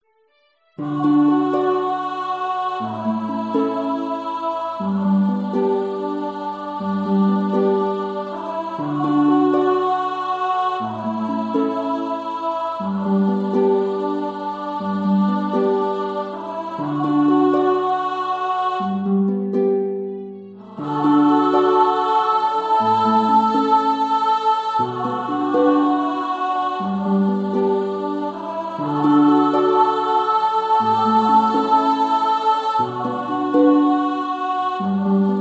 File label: Alto